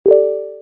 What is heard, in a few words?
build; WaterControl